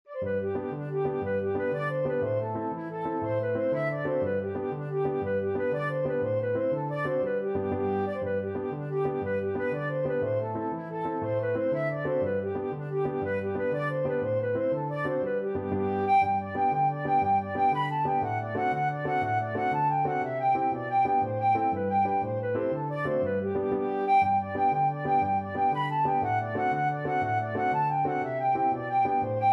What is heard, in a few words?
jig medley, flute